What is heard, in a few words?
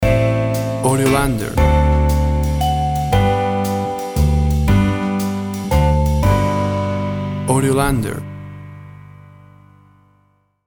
We Wish You A Merry Christmas Jazz Swing Stinger